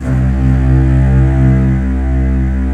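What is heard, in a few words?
bright strings C2; BRITESTRG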